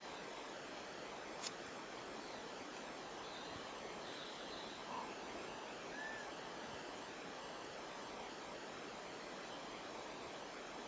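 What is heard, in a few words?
wail, honey buzzard; hexhamshire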